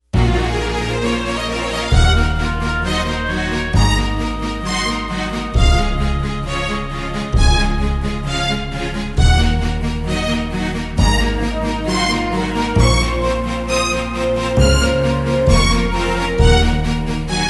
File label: STRINGS; KOLESTON WELLA